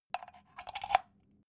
socket plug soft

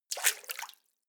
step water sneak in

